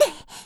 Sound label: attack; Eternal Return; Tia; Voice Line; KOR